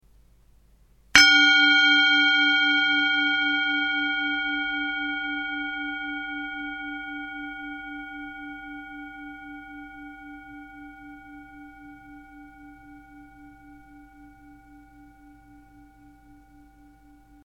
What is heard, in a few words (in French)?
bol chantant